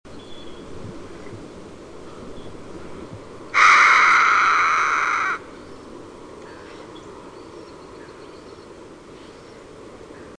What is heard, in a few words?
Harris's Hawk Parabuteo unicinctus